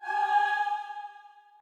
choir

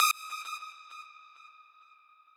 lead

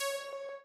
arp

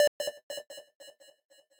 lead6

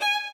solo

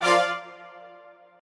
strings

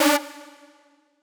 synth

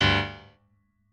piano

